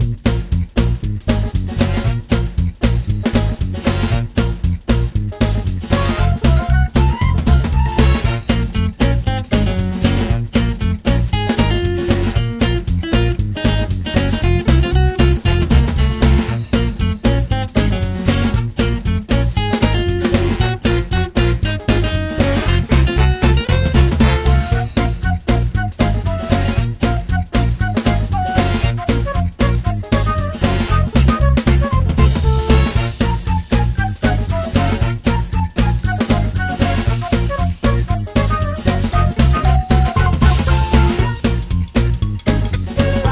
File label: Jungle Music Chase